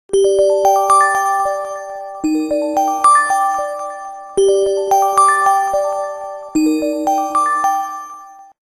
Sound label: Ring Candygram, Windows Mobile ringtones; Microsoft